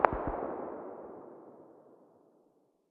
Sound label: hmg very far distance, 12.7x99mm; tacz-tweak-pack; taczmechanics